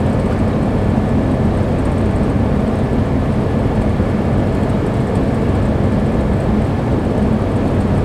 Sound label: vent; ambient; Counter Strike Model Pack; CS:GO; nuke